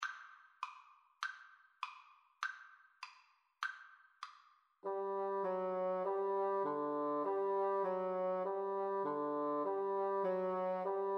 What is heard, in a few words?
Sivivon, French Horn Bassoon Duet